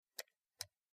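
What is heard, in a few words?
Low pitched Mouse Single, mouse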